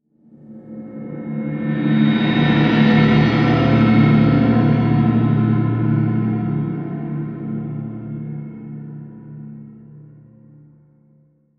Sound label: Dark, Horror Metallic Reverb Swell; Blastwave; Evil Sound Effects